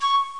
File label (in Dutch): fluit c-4